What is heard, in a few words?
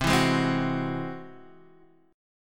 G sharp Augmented 7th C